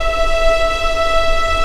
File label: ANG.V E4, ANGELIC